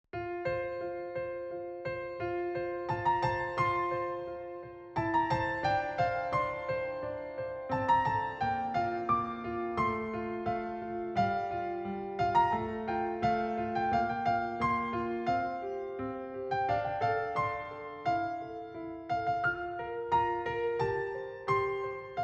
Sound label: Violin; Florence